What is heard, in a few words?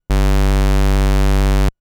Sub G1; fleet keys Samples